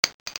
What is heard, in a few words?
shell, weapons